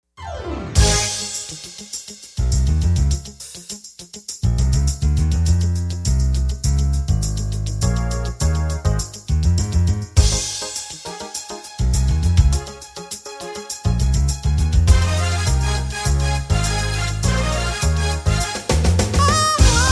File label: Party Band; Boston; This Boston Party Band was created in 1982